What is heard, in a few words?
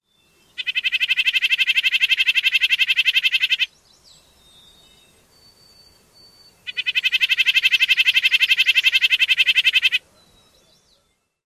rapid call series